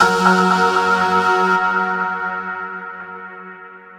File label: Progressive Synth, Trance